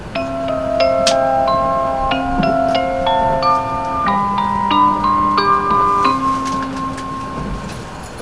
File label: chime; 「のぞみ」東京到着のチャイム